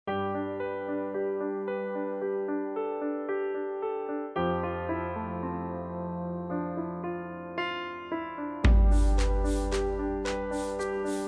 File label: music; luv me buddies wonderland gamerip 2014; Wii U